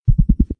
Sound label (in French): clic pin point